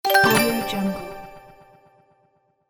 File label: complete